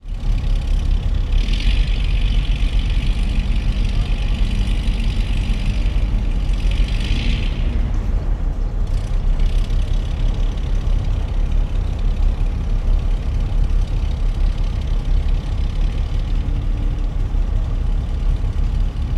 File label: transport boats fishing x2 engines idle in dock